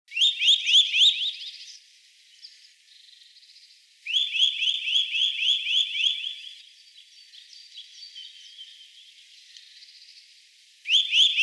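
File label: obyknovennyy popolzen golos, Eurasian nuthatch voice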